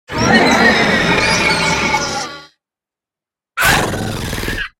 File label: Frog Mutant Growl